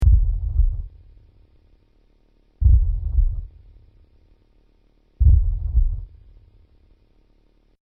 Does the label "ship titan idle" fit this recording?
No